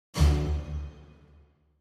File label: devcard used